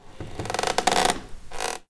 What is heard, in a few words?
wood creaks; Left 4 Dead 2; random amb sounds